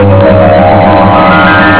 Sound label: Magical, music; sound effects